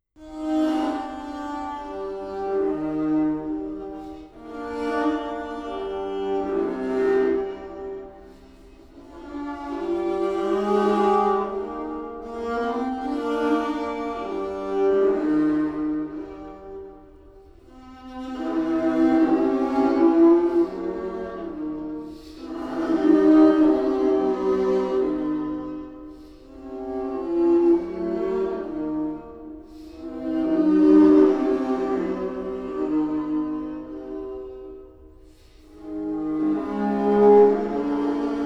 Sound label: viola solo; Strawinsky Elegie